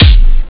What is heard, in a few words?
beat; csound